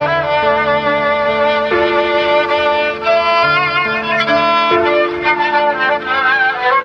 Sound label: strings piano lopsided, Sad Loops